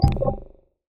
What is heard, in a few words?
chip fail